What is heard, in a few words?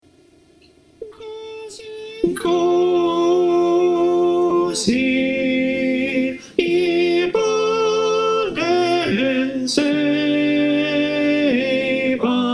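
alto, choir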